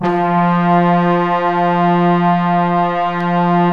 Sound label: BRASS F2; Giga Samples Collection; SAX+BRASS